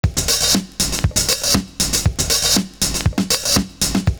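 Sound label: BIG HH OPEN, 119 bpm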